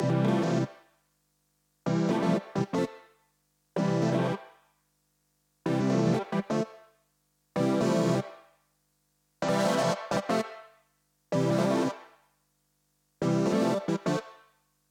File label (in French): synthe en plus